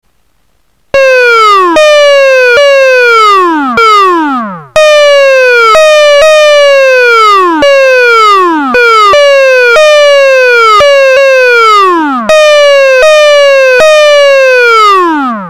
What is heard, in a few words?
blaster